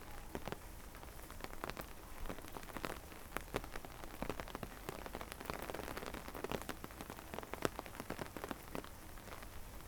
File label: rain umbrella; 99Sounds; Rain And Thunder